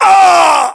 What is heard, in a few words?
death, english